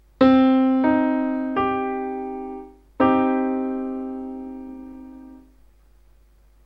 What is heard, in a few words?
minor triad